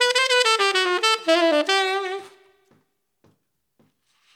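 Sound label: Late And Jazzy 8beat D major 110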